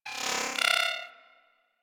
Beep Comby; Warframe; Ambience; Corpus; Beeps

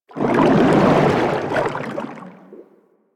Sharkwing Water Movement By, Vehicles; Warframe

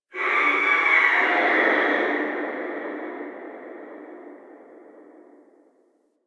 Stadium Infested Far; Warframe; Ambience; Nineteen Ninety Nine

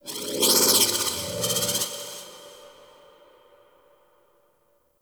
Sculpture Movement Legs; Mountain Pass; Warframe; Ambience; The War Within

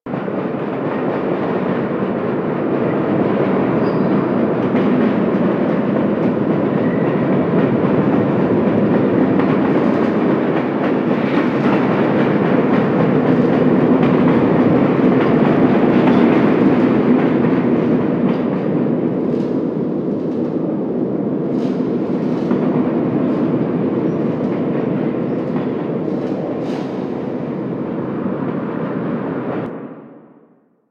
Asteroid Interior Amb A; Warframe; Ambience; Grineer Asteroid

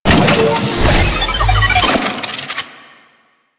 Turret Tether Deploy; Warframe; Zariman

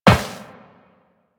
Step Metal Perforated, Footsteps Walk; DE; Warframe; Tenno

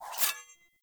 Sword Accent Blade; Weapons; Player; Swords; Garren Sword

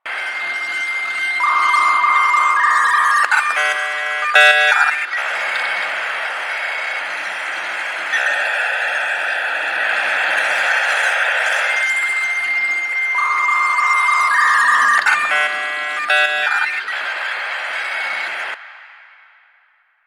Messenger Computer Idle Loop; Ambience; Warframe; Nineteen Ninety Nine

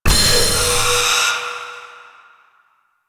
Owl Recon Item Found; Duviri

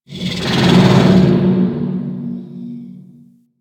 Landscape Coolant Pipe Groan; ambience; props; one offs; Warframe; Venus Open World

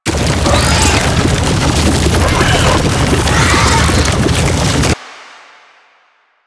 Infested Oni Roll Loop; Warframe

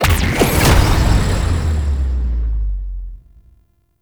Antimatter Portal Teleport; DeepArchimedia

